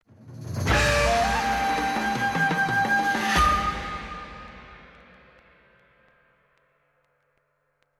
Kungfu Stinger

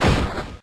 Mach Punch

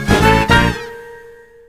Positive feedback; Resultboard

